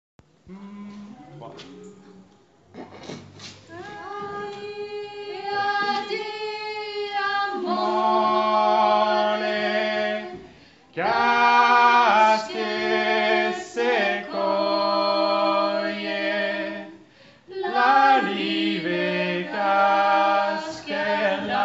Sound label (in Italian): Cade luliva refr voix aigue